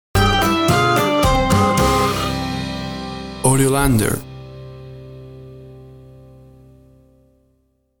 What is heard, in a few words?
Uptempo Silly Happy Music for Kids Stinger